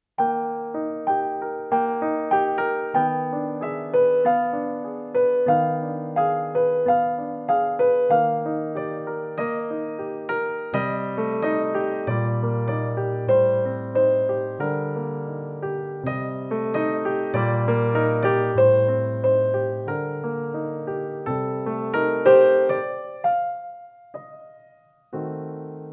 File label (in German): hammond, klangbeispiele